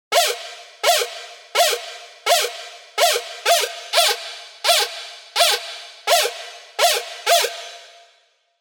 SY Shout, serum 2 bass tech house; revealed serum 2 bass tech house vol 1

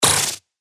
PERC Fusion, percussion; revealed percussion vol 3

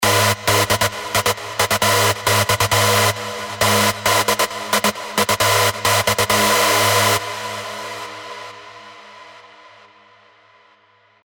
rave stabs; Dunkelheit; revealed spire rave stabs

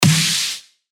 Snare; Mama; revealed claps snares vol 2

